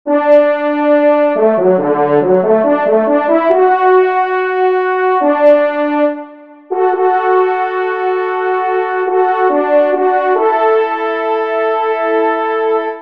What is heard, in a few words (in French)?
Do Maj, trompe; Rally Marseillais; P. Lauge